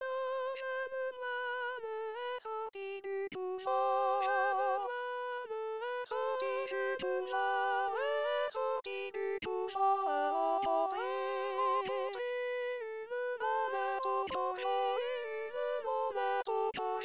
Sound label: tenor